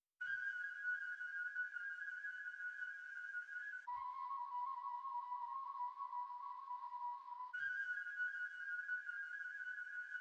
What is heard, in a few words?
131 BPM D# Min Flute; Melody; Loop Stems; Cymatics; 2022 Melody Collection Platinum Expansion; Murderer